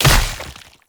Great Sword Impact Flesh, Combat; Weapon Impacts; Player; Swords; Founders Great Sword

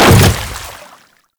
Step Water, Footsteps; Enemies; Warframe; Grineer Hammer Boss

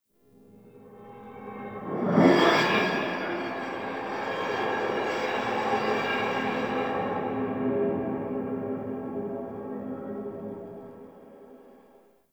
Metallic Drone; Warframe; Ambience; Nineteen Ninety Nine

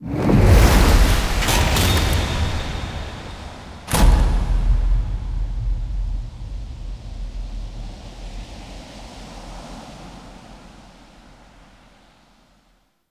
Fight Start Stinger; Warframe; Duviri; Kullervo Encounter

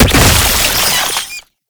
Oraxia Brood Spider Explosion; Warframe

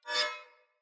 Cleaver Whoosh Accent, Swords; Weapons; Player; Bromius Cleaver

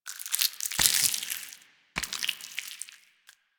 Taunt Flesh, Proto Infested Volatile Runner; Warframe

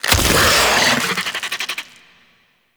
Spawn Pod; Warframe; Colonist Rescue

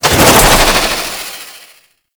Dodge Movement, Foley; Warframe; Grineer Manic

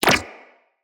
Shield Impact Default; Warframes; Volt

